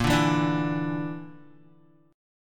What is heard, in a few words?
A sharp Major Flat 5th A sharp down Guitar; strummed